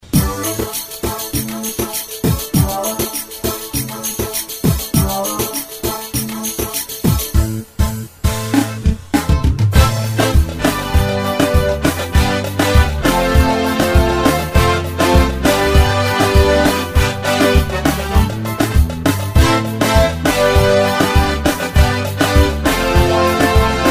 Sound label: turkish fon